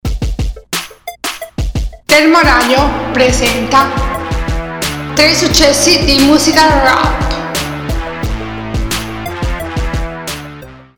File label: jingle rap; successi